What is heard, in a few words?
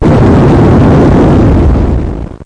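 explosion; targ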